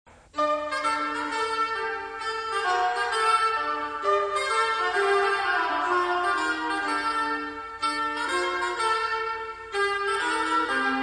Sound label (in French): bombarde; instruments